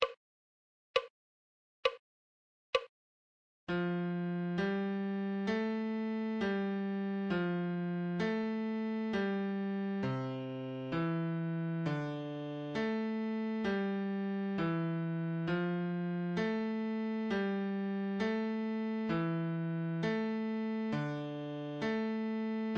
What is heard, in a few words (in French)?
Clé de Fa Adagio